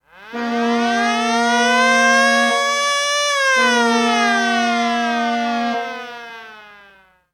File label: Hockey Goal, Hockey Horns & Buzzers; SFX Kit; Toronto